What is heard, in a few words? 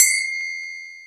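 R8-PRC, R8 percussion; E-Lab; X Static Goldmine 1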